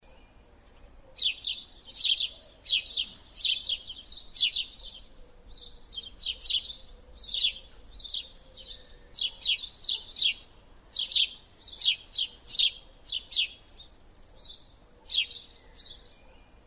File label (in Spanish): CANTO del GORRION